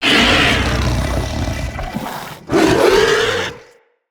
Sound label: Corrupted Battle Dog Enemy Spotted; Animals; Vocals